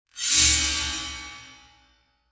bright sword

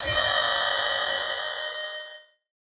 Horror Glitch Radio Signal; SoundsCrate

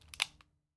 Revolver Hammer Back; SoundsCrate

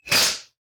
snake attack

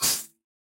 snake attack

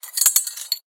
chains rattle

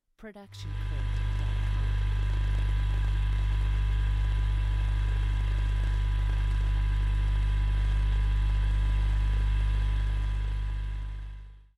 lightsword humming; soundscrate

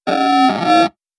robotic glitch

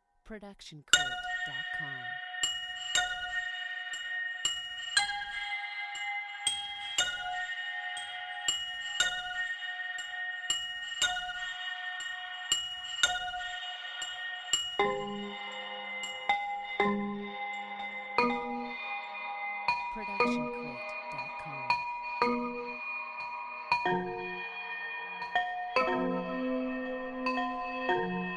wedding bells; soundscrate

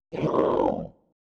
bear

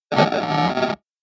computer glitch

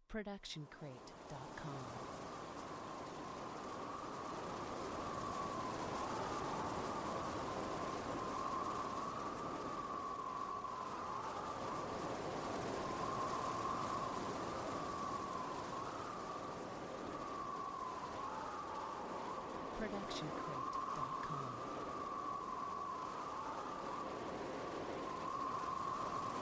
wind flying; soundscrate

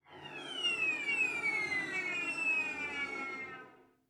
Cello Seagull Effect; SoundsCrate

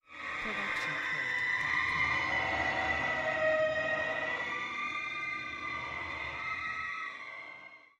Screamy Screetchy; soundscrate

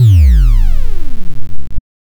drop; videogamebundle; soundscrate

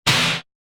lightsword lightsword clash; soundscrate

